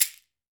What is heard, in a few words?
Latin Shakers, mexican